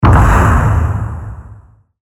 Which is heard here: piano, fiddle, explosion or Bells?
explosion